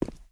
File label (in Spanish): Paso zapato cemento